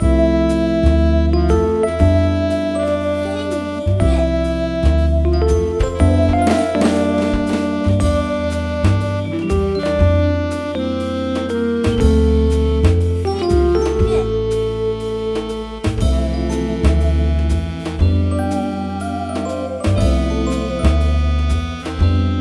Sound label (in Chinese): 轻爵士-悠扬-舒缓